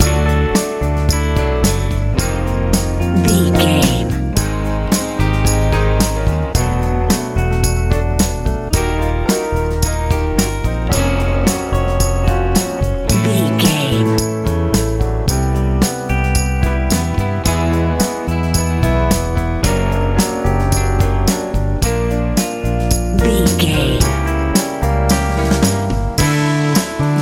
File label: hot sunny worship soul